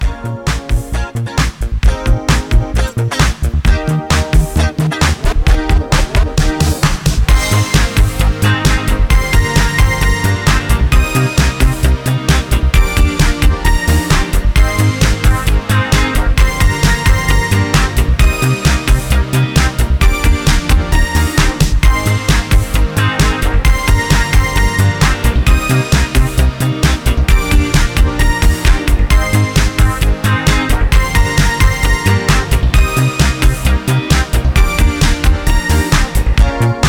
Rhythm Theme (132 BPM); Yum Yum Cookstar

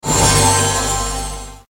Talent UP; Endless World Idle RPG

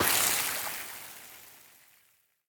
liquid; teardown ps5 windows xbox series xs gamerip 2022

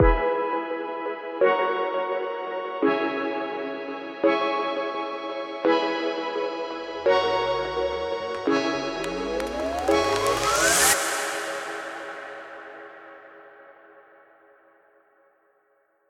Quasar Middle

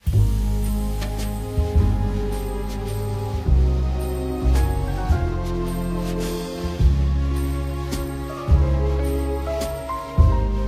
Christmas Theme

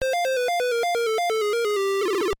Lose Life; Animal Crossing; Clu Clu Land

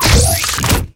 Pickup laser gun; Talking Tom Gold Run

